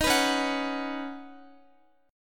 Db 7th Suspended 2nd Suspended 4th, Guitar; Db7sus2sus4; strummed; tuning=4F,4A,5C,5G,5C,6E

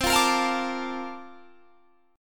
Bb Major 7th, guitar; strummed; chord shape

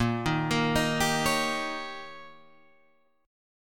D sharp 7th A sharp, guitar

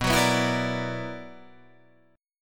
B-Minor Major 11th B down Guitar Drop D

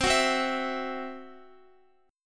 C Major Flat 5th, C, down, Guitar; strummed; CMb5

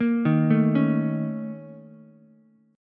Bb Minor Major 7th Double Flat 5th, Ukulele; 3,3,5,4; arpeggiated; chord shape

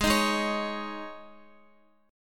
Ab Suspended 4th, Guitar; Absus4 strummed; x,x,6,8,8,8; JGuitar

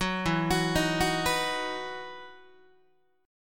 E Major 7th Suspended 2nd, Guitar; EM7sus2 arpeggiated

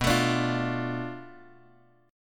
B Minor 7th Flat 5th B Guitar DADGAD

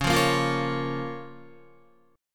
C sharp 6th C sharp Guitar; C#6; strummed; tuning=4E,4A,5D,5G,5C,6F; guitar chord

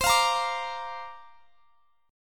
C 9th Suspended 4th C down

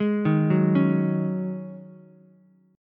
G sharp 7th Suspended 4th G sharp, Ukulele; G#7sus4 arpeggiated; 1,3,2,4; JGuitar

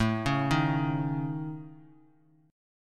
A Major Flat 5th, Bass Standard; AMb5; arpeggiated; JGuitar

